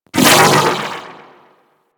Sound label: Amalgam Override Vocal Pain; Warframe